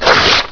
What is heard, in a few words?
PAPER RIP